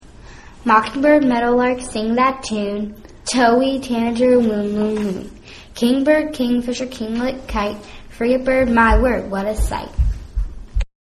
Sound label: Bird Bird Bird A Chirping Chant